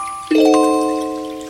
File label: LG W10 Notification